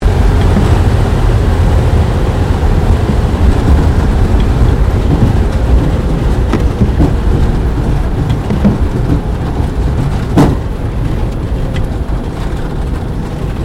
1945 HAWKER SEAFURY LAND INTERIOR, Hawker Seafury fighter-bomber; Airplane; Vehicles; Aircraft; Aircraft Collection; Twistedtracks